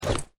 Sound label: grenade throw